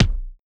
Kick DnB

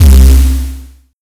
Kick Dist Buzzed; Bitbeast

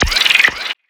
Hi Tech Drum Kick Synth

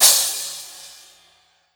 Crash Trash Long

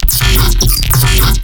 Space

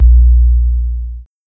Kick 808

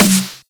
Snare Dubstep; pitchglitch

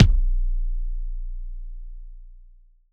Kick DnB Kick

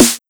Snare TR909

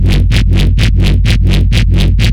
Sound FX Bass FX Loop Granular; Granular Sumo

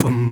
big kick; foxdot default

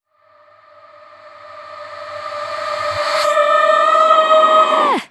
Riser Vocal A

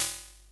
hihat open

